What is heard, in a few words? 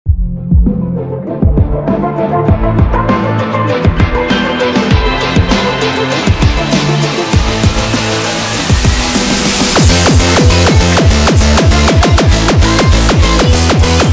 hardstyle frenchcore remix